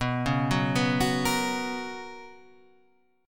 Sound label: B Major 7th Suspended 2nd Guitar Half Step Down, chord